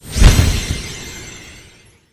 wild On Wild Landing; gods of fortune

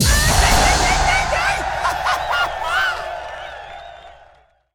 super Mega Win; colossal pinatas

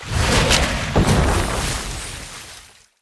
croc jump; jumanji

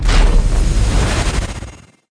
big Win Ramp Up; fairyhansel

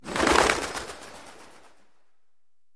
wild Pigeons Fly; fairyhansel

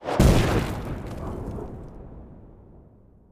fs One Collect Added; jack and beanstalk

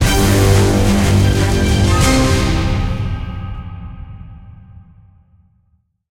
Jackpot Outro Splash; godsoffortune

